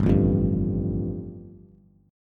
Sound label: G 7th Suspended 2nd G, Bass; G7sus2 strummed